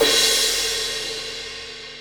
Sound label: 18 inch thin crash, cymbal; Paiste